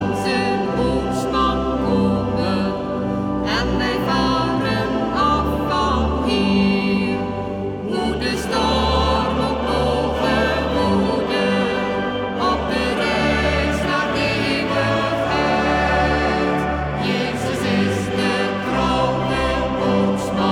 koor met solisten

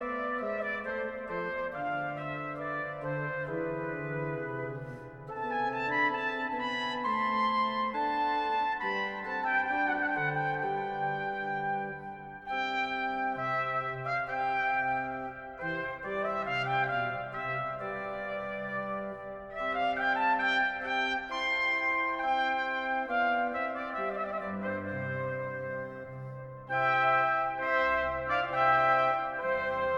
Instrumentaal trompet

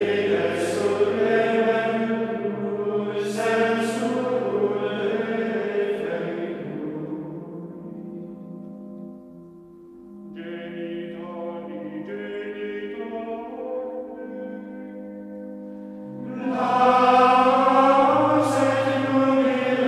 Koor mannen Gregoriaans; Tantum ergo

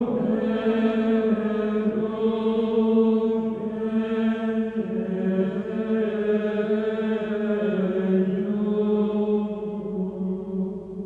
Koor (mannen) Gregoriaans; Puer natus est nobis

